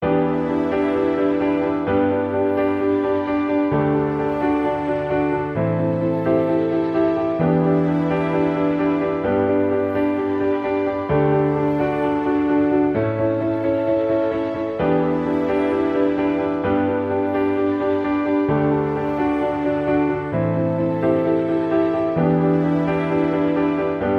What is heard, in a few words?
Piano Synths